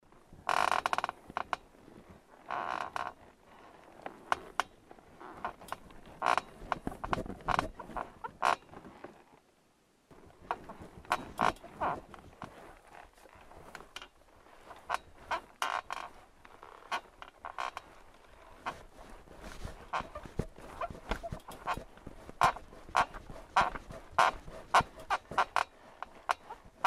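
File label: skrip derev krovati, creak of wooden bed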